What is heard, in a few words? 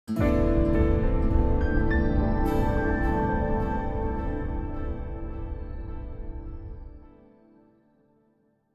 contemplative; Joe's Diner